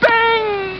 Bing

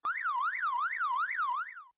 Tone Alert

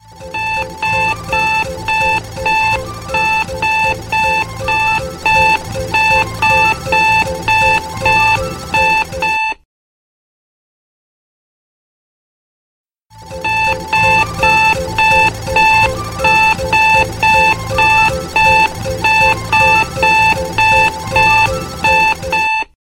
Snooze Alarm

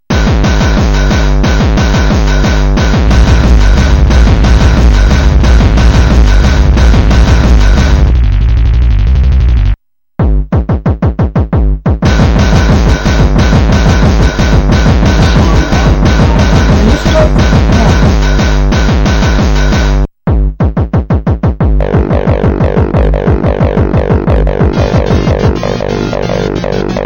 bass

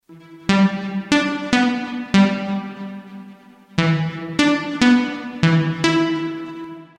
Ring

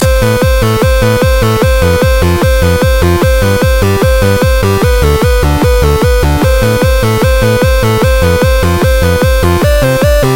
vinyl

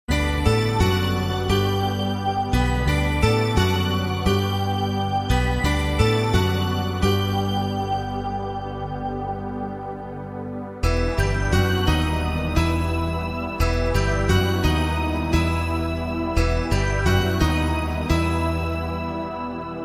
Taal instrumental

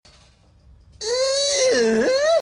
Funny Sound

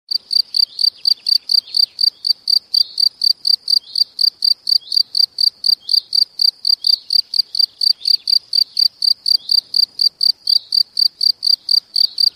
Insects

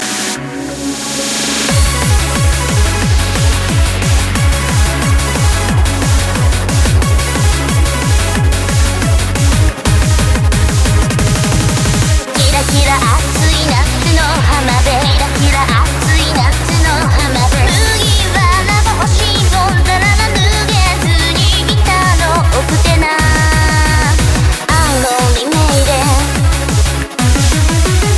Romantic Summer Rmx

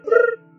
Brrt

